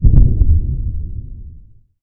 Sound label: Ambience